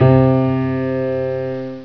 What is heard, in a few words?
piano C2